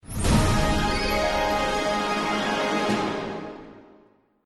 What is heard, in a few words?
bonus summary, slots; Realm Saga